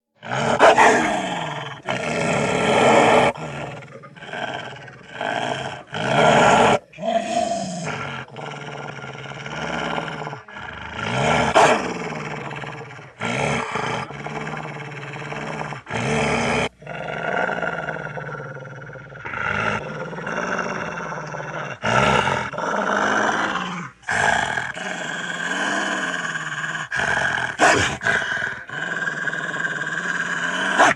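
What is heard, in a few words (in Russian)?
волк рычит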